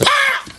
powww

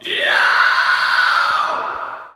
vampire scream short

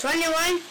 bass boosted

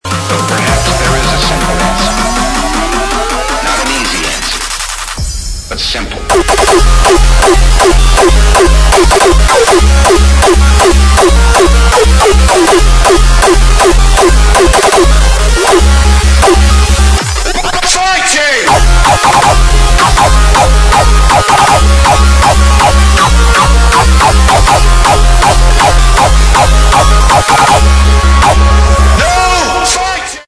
rawstyle no fighting

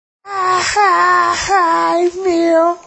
hahaha

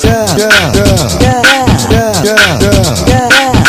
dubstep; Robotnik

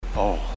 ooohhh

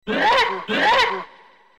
laugh; donkey kong

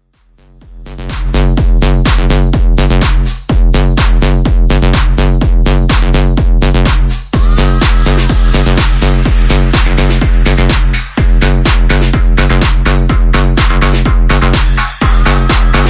house music

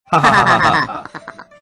henchmen laugh

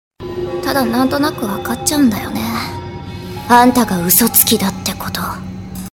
argh

